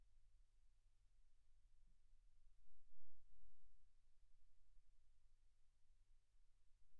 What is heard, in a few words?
silence 48kHz